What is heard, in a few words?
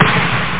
row drop; FredFish PD 305; Obsess